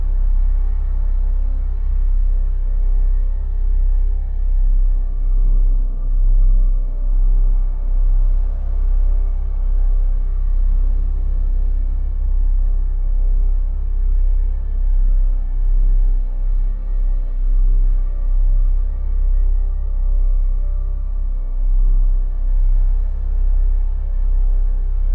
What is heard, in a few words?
Music loop